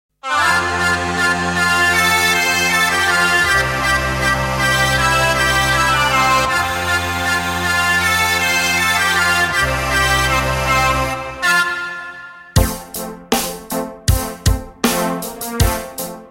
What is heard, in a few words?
scottisch dance